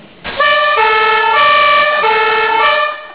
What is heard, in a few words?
lo-horn